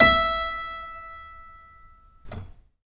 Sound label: hchord e6